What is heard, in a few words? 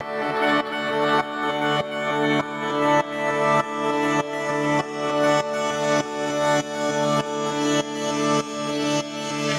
Dmaj Gtr Pitched Drone; Zero-G; Future Wave